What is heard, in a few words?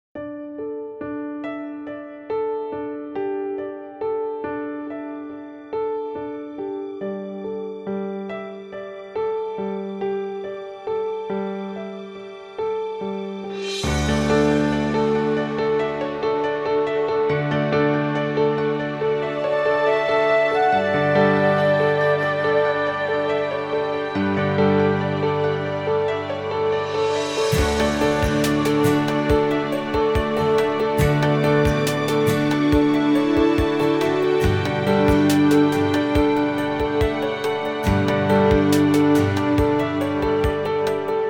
piano